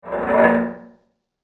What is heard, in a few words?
Open The Crypt, ghost; Ghost Sound Effects; horror sound effect